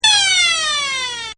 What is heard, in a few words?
Dive WINDDOWN; Rises And Dives; Sound Design; Partners In Rhyme